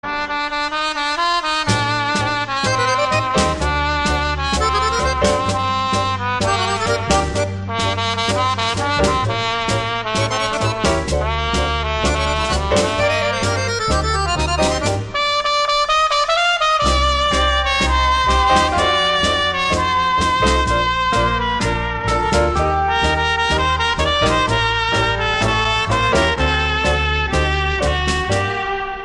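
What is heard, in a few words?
tango melody; iz filma Brilliantovaya ruka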